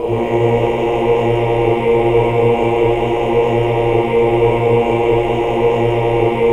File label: AAH